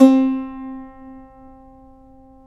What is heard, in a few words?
SAZ; Spectrasonics; Hans Zimmer Guitars VOL-2